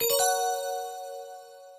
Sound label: retrigger appear